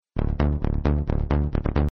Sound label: synthesizer